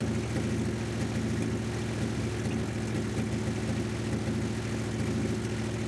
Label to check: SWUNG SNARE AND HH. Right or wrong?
Wrong